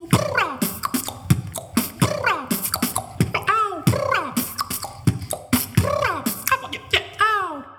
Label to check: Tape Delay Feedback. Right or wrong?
Wrong